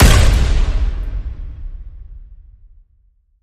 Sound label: Hoop Hustle Breaking Slam